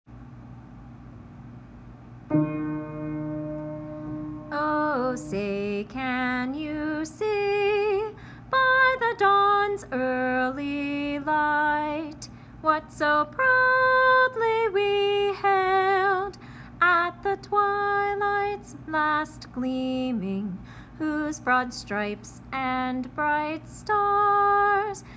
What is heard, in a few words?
accapella